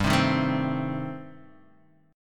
A-Minor 7th Flat 5th G, chord

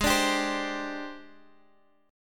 Ab Major 7th Flat 5th Ab down, guitar chordshape; AbM7b5; strummed; JGuitar

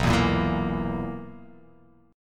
B Major 7th Suspended 4th B down

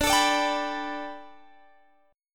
B Minor 7th Sharp 5th D, chord

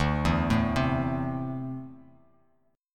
D 7th Suspended 2nd down Bass Standard; D7sus2 arpeggiated

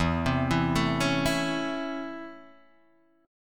Db Minor Major 7th Sharp 5th E

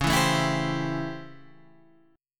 A 7th Sharp 9th; 9,7,7,9,8,8

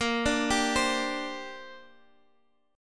A sharp 6th Add 9th chord; arpeggiated; chordshape; x,x,8,7,8,8

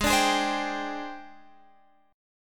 G sharp Major 7th Flat 5th, Guitar, Open F; strummed; G#M7b5

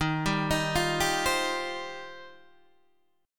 Eb 6th Add 9th, Guitar, DADGAD; 13,10,13,10,10,10

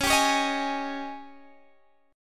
Ab Major 7th Suspended 4th Db

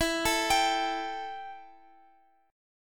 E Minor Double Flat 5th E Guitar Open F; Embb5; arpeggiated; x,x,x,11,12,14

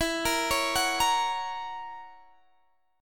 Gb 7th E, chord

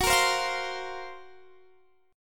Gb Major 7th Flat 5th Gb, Guitar Standard; strummed; JGuitar

